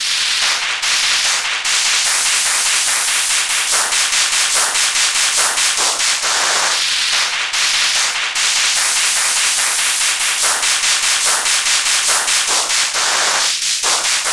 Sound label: applause